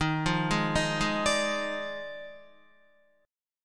Eb Major 7th Suspended 2nd Suspended 4th Eb Guitar 4F 4A 5C 5G 5C; arpeggiated; 10,8,8,8,8,10; EbM7sus2sus4